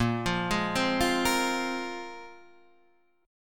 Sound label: A sharp 7th Suspended 2nd down